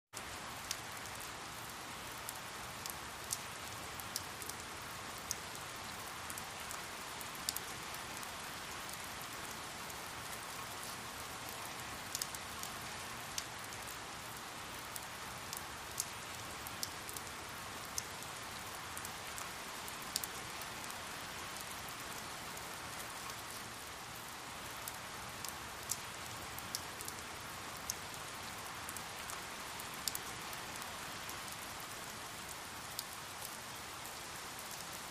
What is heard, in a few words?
Rain; Light, With Some Splatty Drops On Asphalt.